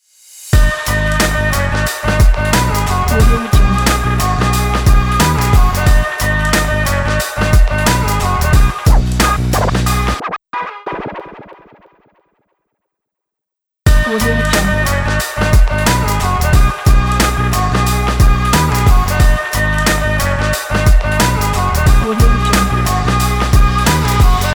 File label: lofi hiphop intro logo